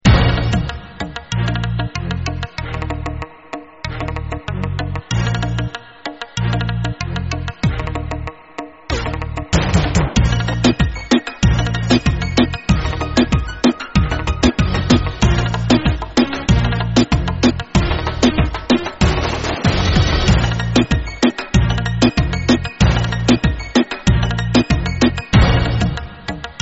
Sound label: Reggaeton